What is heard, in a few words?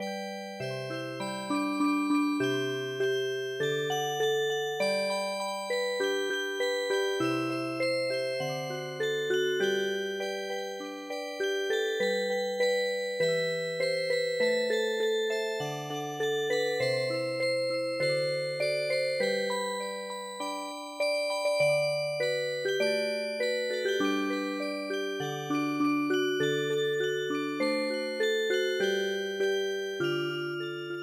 La Marseillaise Music Box